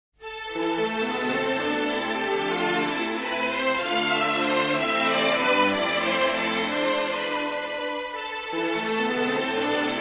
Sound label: brass minus